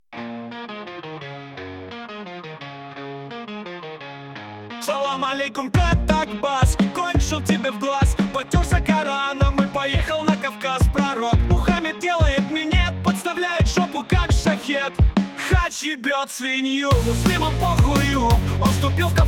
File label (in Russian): batya i gitara